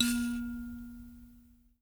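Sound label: high kalimba A#3; Heart of Africa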